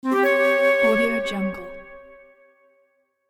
enigmatic flute

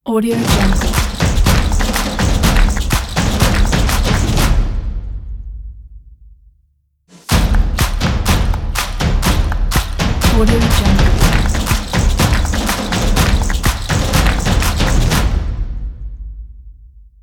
modern drums ident; musicmediagroup

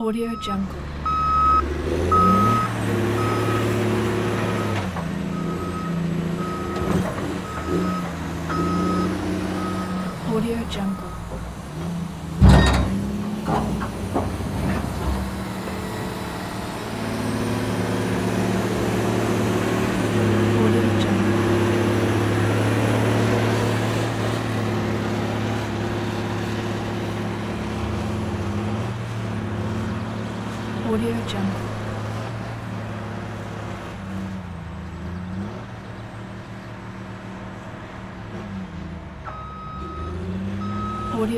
construction soundscape

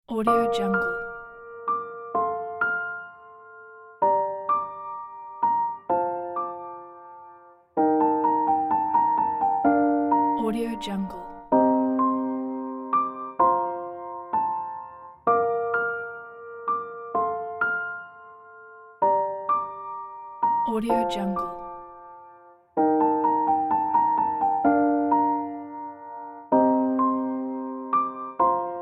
calm forests ambient documentary piano

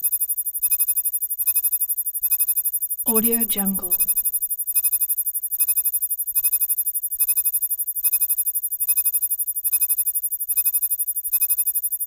alien switches; prosoundfx

